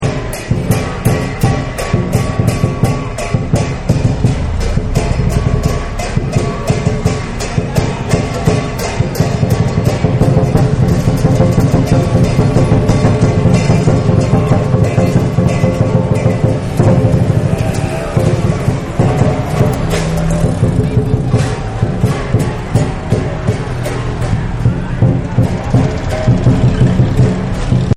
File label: Chinese New Year; Restaurants; Chinese New Year Loop2; Partners In Rhyme